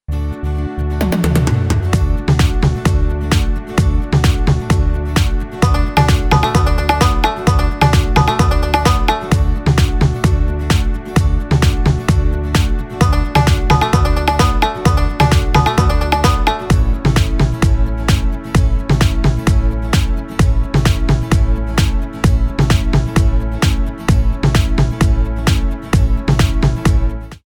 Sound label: Karaoke Song